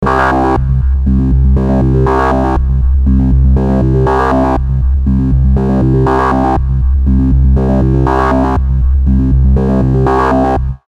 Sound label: Digi Pattern